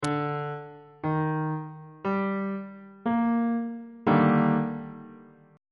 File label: d sharp major 7th 3rd inversion on bass clef